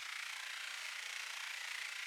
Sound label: Battery Indicator Machine On Hum Loop